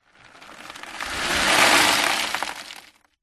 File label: stones small debris in cardboard box tip to side movement; foley; zapsplat